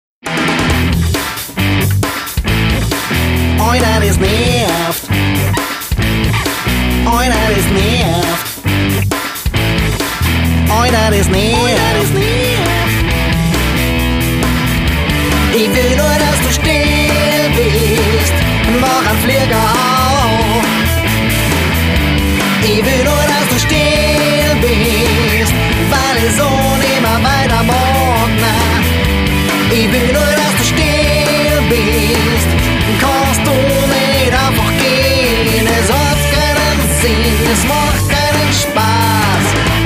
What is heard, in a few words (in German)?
klingelton; Oida; Du spinnst doch